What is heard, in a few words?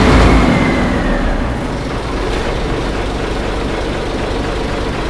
v8 throttle off fast loop; ratmobile; vehicles